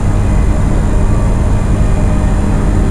Machine Dynamo Loop; Machinery - Generators

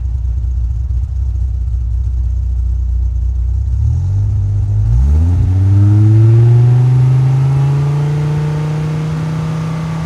Viper GTS Fast Idle; Automobiles

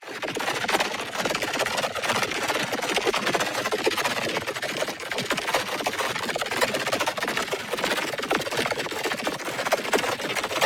ABILITY CHARGE Electrified Lines; boom library; Matter

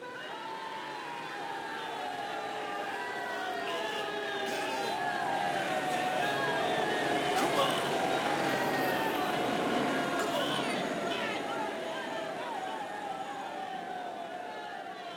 Crowd Noises Jeering; Pitch Black Audio Pong

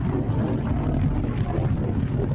pinging; firefight

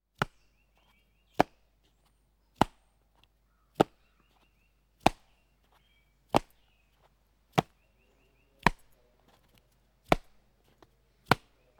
Baseball Hitting Glove; KMP Film Video Sound Effects

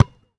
Street Ball Bounce Dirt, Street Basketball; SFX Kit

